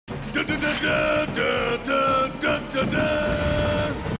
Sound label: fanfare